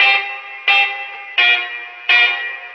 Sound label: guitar, Loops; Phonky